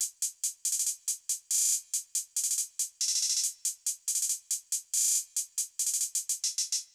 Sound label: Hat Loop